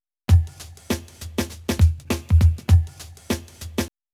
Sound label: Loop Dry